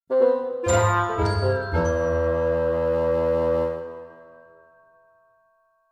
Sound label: Stinger Comedic; Costume Quest 2